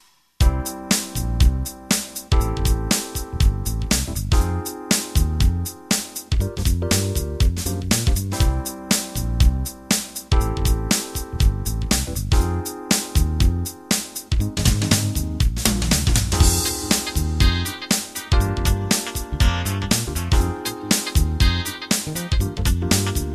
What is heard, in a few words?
Rubber Funk